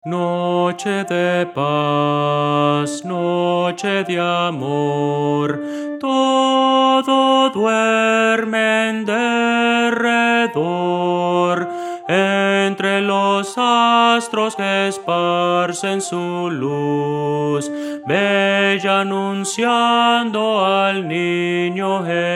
soprano; noche de paz noche de amor

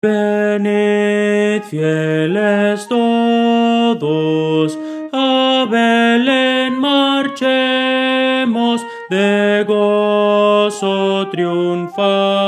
soprano; Voces para coro

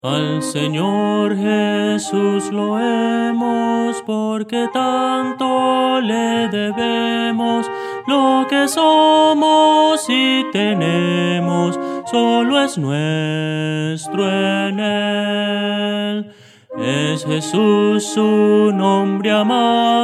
melodia

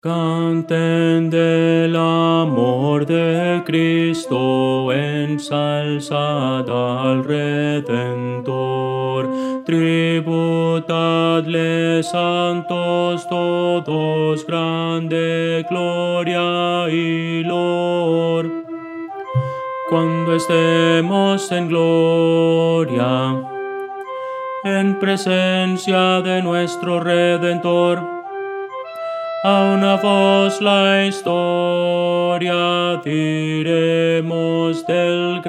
contralto

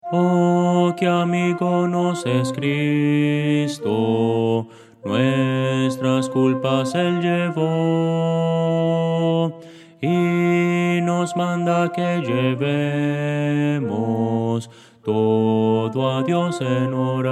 contralto; oh que amigo nos es cristo

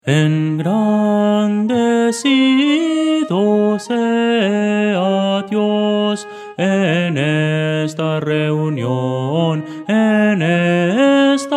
melodia; Voces para coro; Soprano

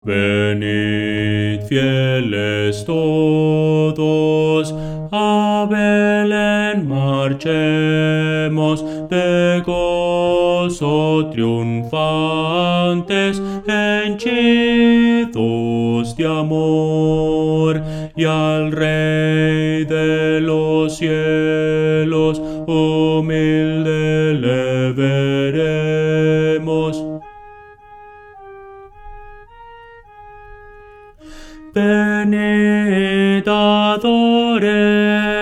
venid fieles todos bajo, himno

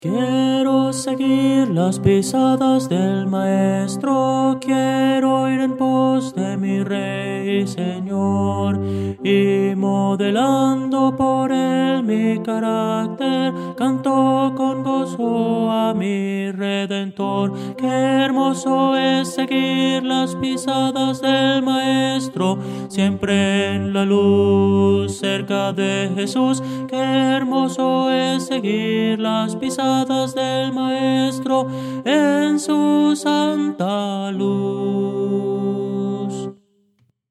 tenor

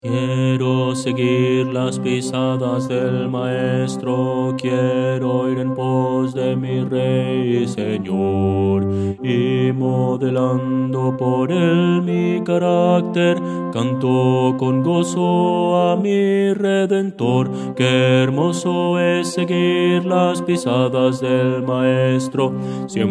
bajo

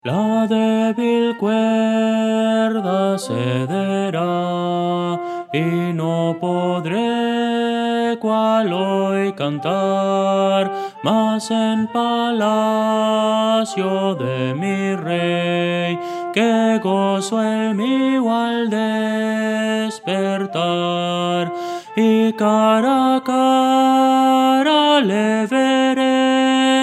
melodia, himnos cristianos; la debil cuerda cedera

